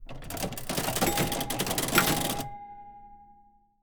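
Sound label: ui progress bar up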